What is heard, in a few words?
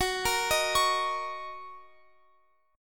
Fsharp 6th down Guitar Open F; F#6; arpeggiated